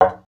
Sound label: wood hit; wood metal SFX